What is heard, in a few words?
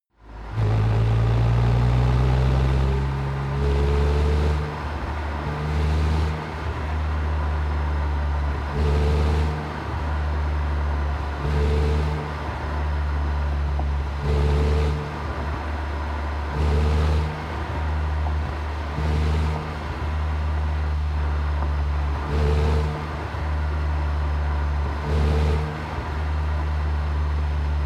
sports car int engine short thrusts sequence rpm low; verkehr transport; autos